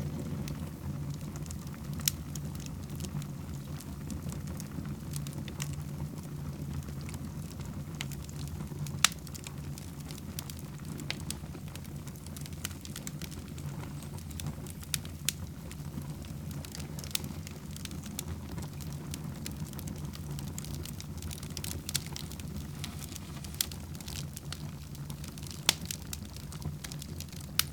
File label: fireplace, ambiances; CosmicRage